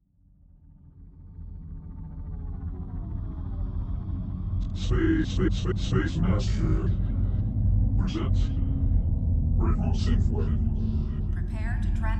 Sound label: Retro Synthwave